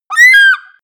eagle call

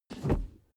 suv push forward gear straight fast; verkehr transport; autos

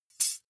midsize metal thin clank drop

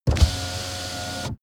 car window back open part

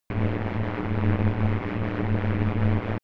space background noise bright

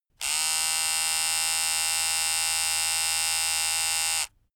razor long

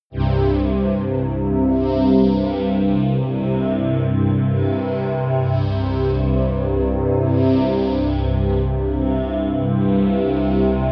pad sweep electro; flaechen

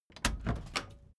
case lock open

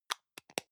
deo can lid close medium, badezimmer; haus-garten

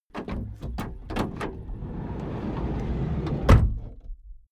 van slide door close; Autos

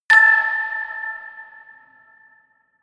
notification glockenspiel creepy fourth

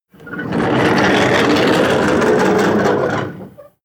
slide wooden door short

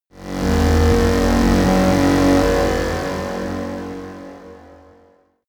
sci-fi cosmic high dark energy; horror

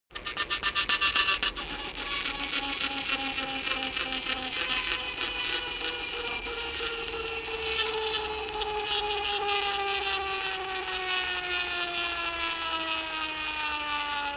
bicycle spin tire scratch plastic; fahrrad; verkehr transport

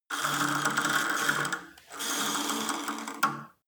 cable machine old pull release, fitnessstudio

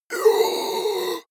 monster inhale; kreaturen

